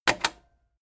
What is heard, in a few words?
cassette eject click fast heavy